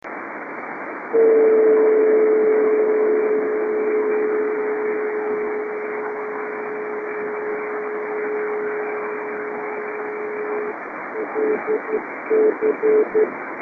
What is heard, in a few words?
28091KHZ